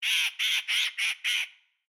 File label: crow; the wolfs night